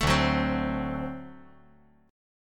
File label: D 7th, down